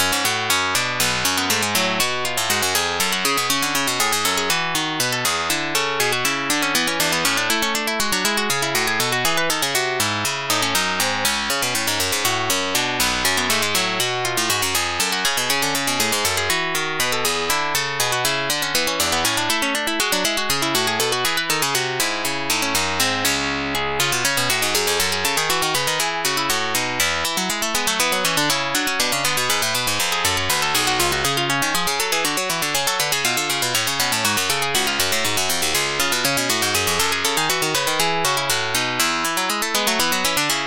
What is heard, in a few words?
bourree